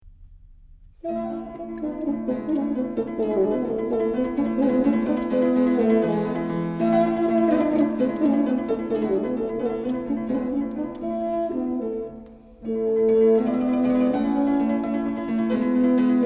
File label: Bassoon Sonata No.1 in B flat